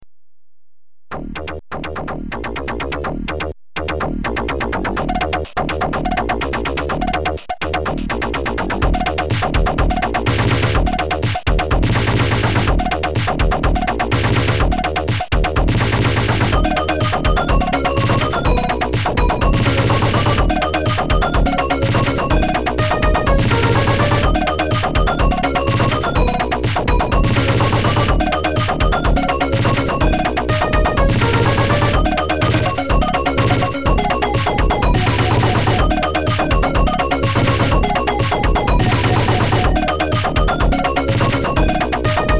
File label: fast; Enemies 3; amiga; enemies iii